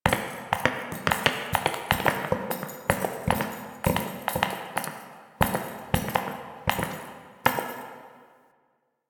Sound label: Idle Walk In Circle; Ambience; Warframe; Entrati; Tagfer